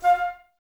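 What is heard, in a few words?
flute, Woodwinds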